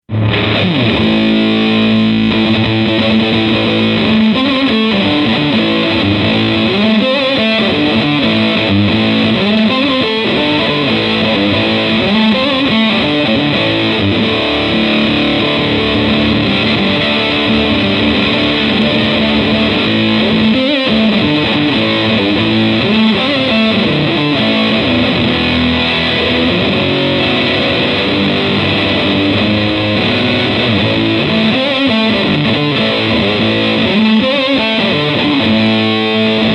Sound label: Two Fuzz